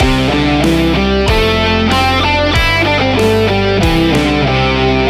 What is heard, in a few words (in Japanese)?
メジャーペンタ